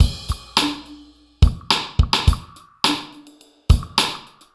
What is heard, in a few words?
Drums